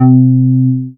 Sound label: MOOG BASS C4; Best Service Dream Experience